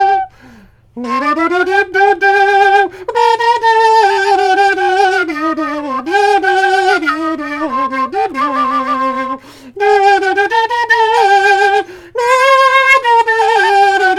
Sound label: Dzhura sopilka soprano chromatic Ukrainian flute and kazoo; handmade